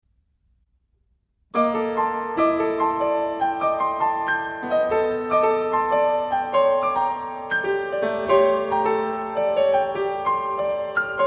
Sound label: pieces for piano